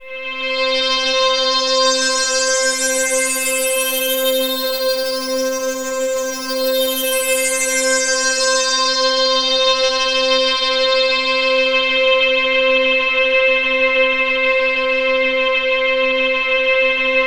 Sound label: Access Virus B; Synthworx